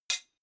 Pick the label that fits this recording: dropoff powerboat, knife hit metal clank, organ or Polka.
knife hit metal clank